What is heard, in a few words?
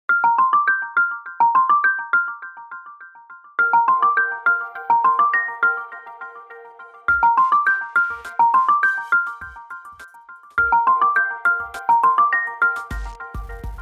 Spray, ringtones